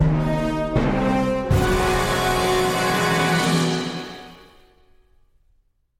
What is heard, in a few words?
dun dun dun; SoundsCrate